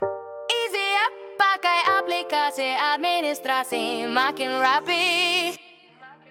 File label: jingle easy app